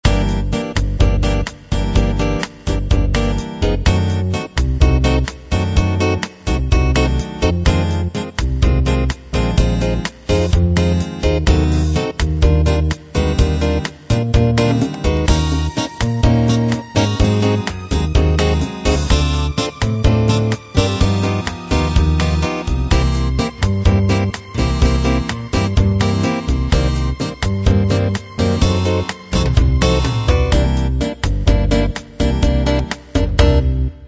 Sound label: bossa